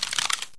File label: revolver Reload; weapon; Nuclear Tech